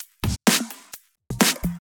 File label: Electro Loop 128 BPM; Vengeance Samples